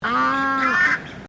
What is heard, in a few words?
Birds Ibis Australian White Mature Honk; Birds from South Australia; Animals